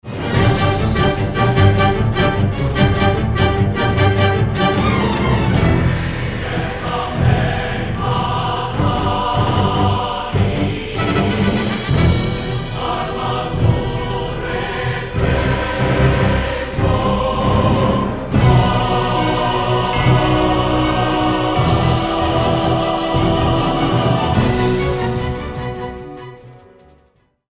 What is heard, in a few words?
battle music sample; aoe iii